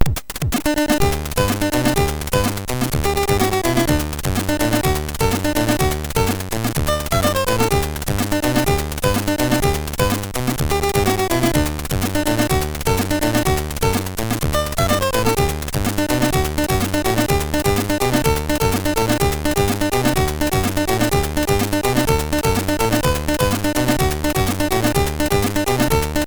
Samba sid MOS6581R3

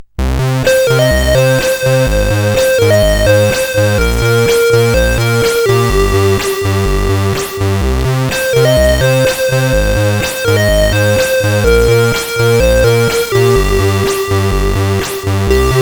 Suburbia, CSG8580R5

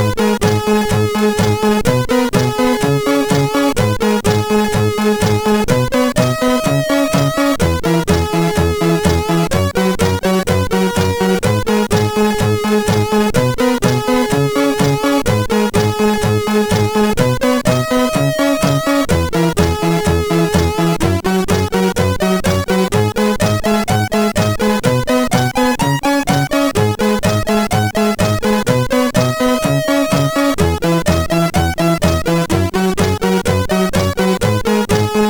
Disco, MOS6581R4

